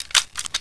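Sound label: 357 cylinder; weapons; svencoop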